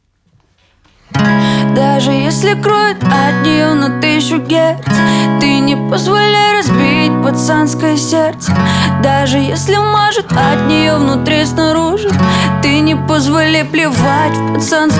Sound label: Acoustic